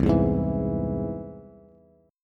C Augmented, Bass, chord; strummed; 8,7,6,9; JGuitar

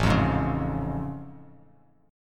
A Augmented Major 7th A Bass Standard; strummed; JGuitar

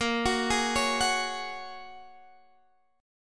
Bb Minor 7th Sharp 5th, Guitar, Half Step Down

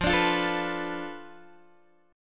F 6th Flat 5th F down Guitar DADGAD, chord

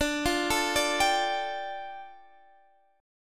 A 7th Suspended 4th Sharp 5th D

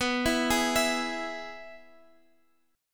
E-Major B

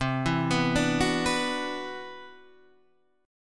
C Major Sharp 11th; CM#11 arpeggiated; JGuitar

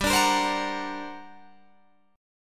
G Minor Major 11th, G, Guitar, Drop D

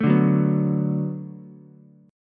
D 6th D down Ukulele, chord; D6 strummed; JGuitar

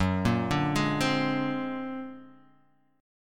A sharp Augmented 9th F sharp, guitar

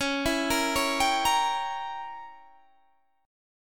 Db Diminished 7th Db down Guitar Standard; arpeggiated; JGuitar

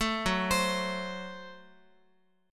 Gb Diminished Guitar; arpeggiated; JGuitar

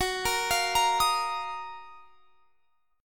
Gb Major 13th; GbM13 arpeggiated

